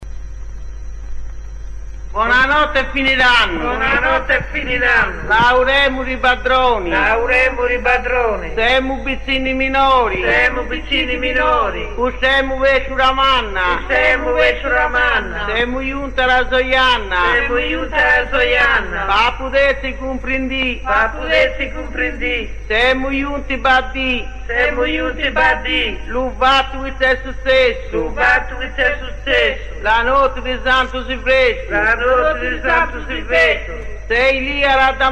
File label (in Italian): gobbula, canti polivocali